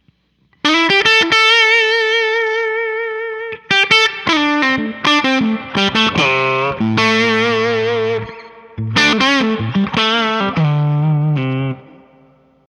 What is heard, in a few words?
lead, guitars